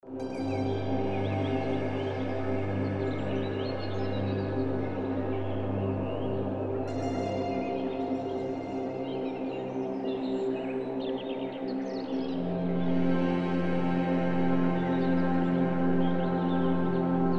Amb Sound Scape Forest sounds, Reverb Dulcimer; Nature; Ambient SoundScapes

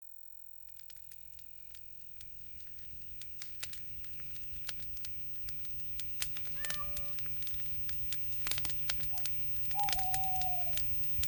Forest Night Sleep, Nature Sessions; RobinHood's SpectraStrobe Nature Sessions

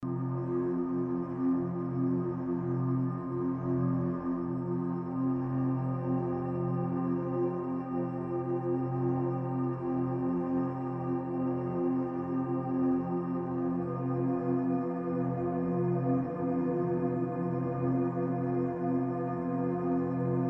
Deep ambience, Metallic Shimmering; Ambient SoundScapes

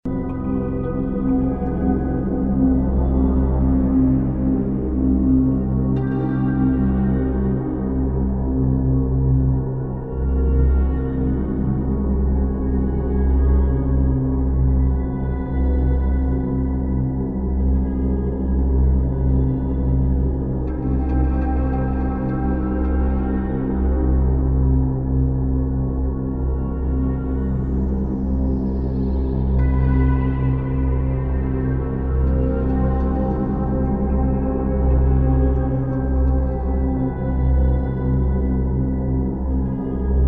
Amb Sound Scape Ethnic pluck, deep drone, synth; Ambient SoundScapes

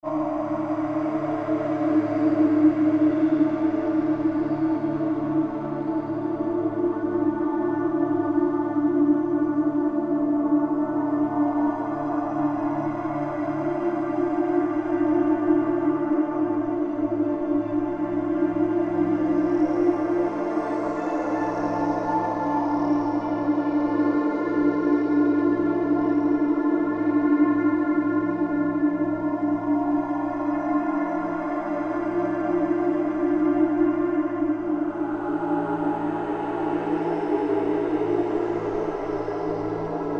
Ambience and various FX; Ambient SoundScapes

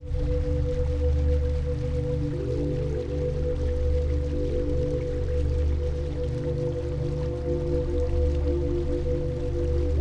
Flowing water stream, Deep drones, Light melodies; Nature; Ambient SoundScapes

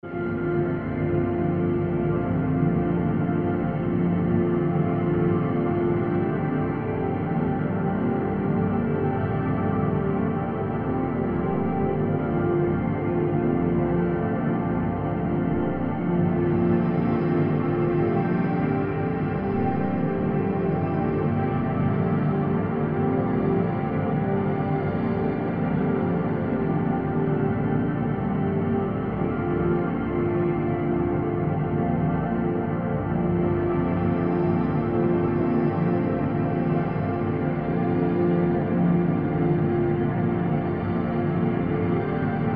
Warm chords, Panning FX; Warm Ambient; Ambient SoundScapes

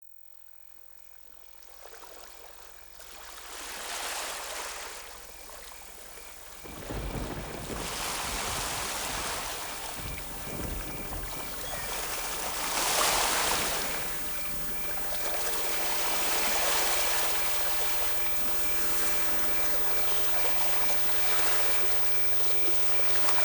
Coastal Thunderstorm; Meditation; RobinHood's AS Sessions

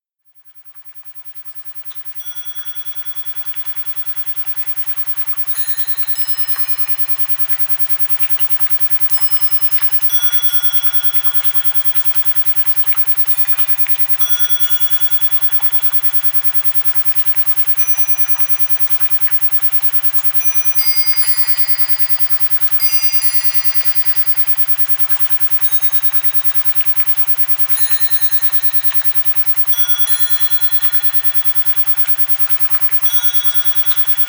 Rain and Wind Chimes; Sleep Session (subtle entrainment); RobinHood's AS Sessions; Moodstreams

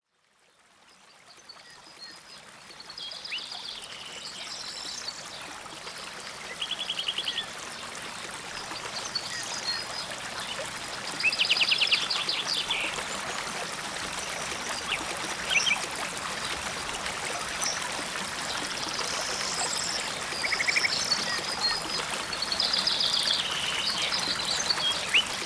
Mountain Stream; Relaxation - 28 min; RobinHood's SpectraStrobe Nature Sessions

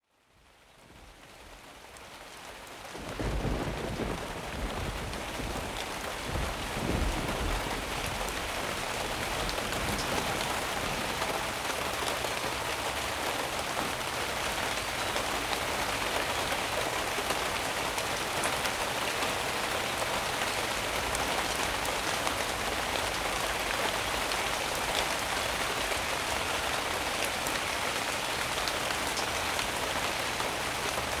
Severe Thunderstorm; Relaxation 25 min; RobinHood's AS Sessions

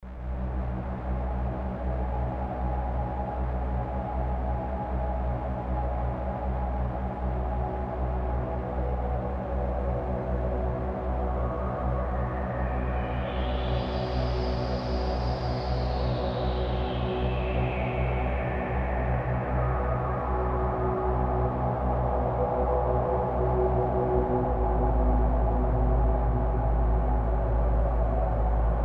Amb SoundScape Open, Airy, Windy or Outer Space; Outer Space - Floating; Ambient SoundScapes

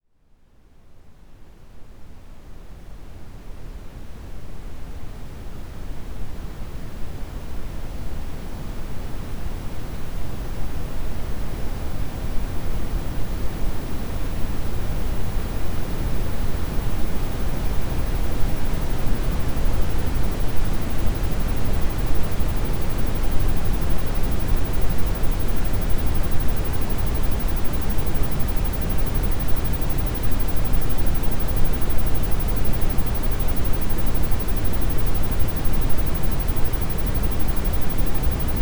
Brown Noise; RobinHood's AS Sessions

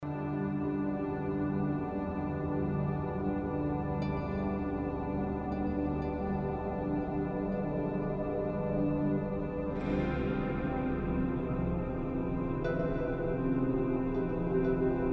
Nylon guitar plucks echoing, Deep drones; Ethnic; Ambient SoundScapes

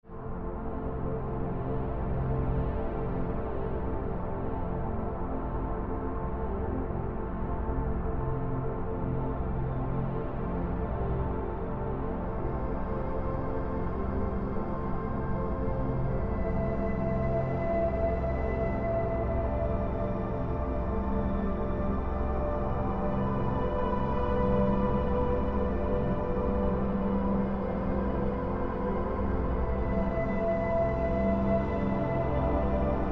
Amb Sound Scape Flute, Airy chords; Outer Space - Floating; Ambient SoundScapes

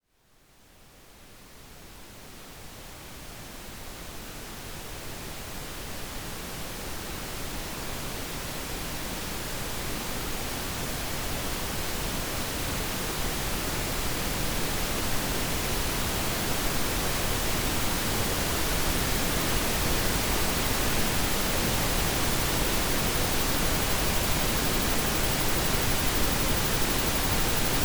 Pink Noise - Relaxation; RobinHood's AS Sessions

